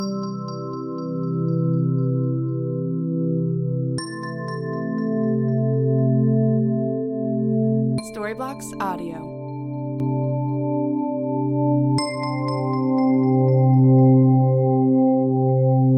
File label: Ambient House Synth Chimes 120BPM C